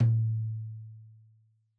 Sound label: Tom2 Stereo, Deeply Sampled Drums; SMDrum